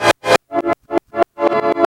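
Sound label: SLAP SEQUENCE; Spectrasonic Distorted Reality 1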